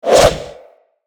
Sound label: Boomerang Throw; Weapons